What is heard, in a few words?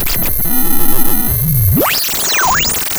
pop, Sound of a cat meowing